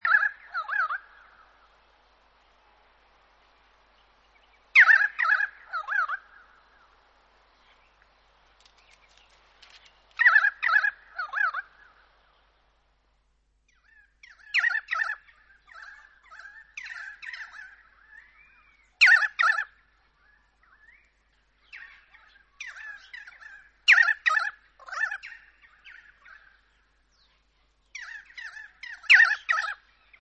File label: Pied Currawong